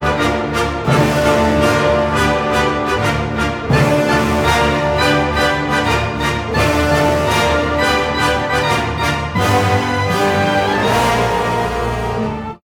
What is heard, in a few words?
celebratory sting; BOE gold crate thru hole; I Am The Doctor